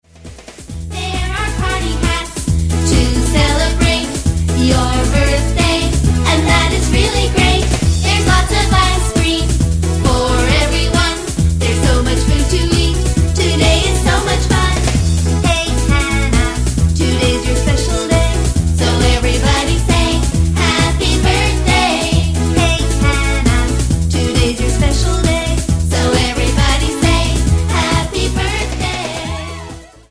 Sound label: Everybody Say Happy Birthday